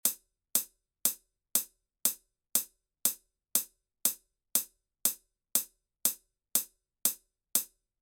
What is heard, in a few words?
closed hi hat